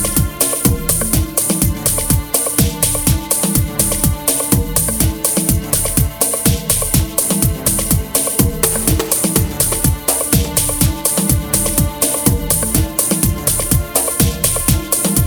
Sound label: Drums of Africa